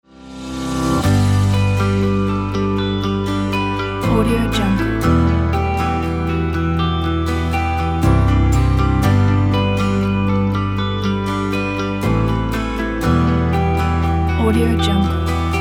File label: romantic emotional indie folk; monkeystyle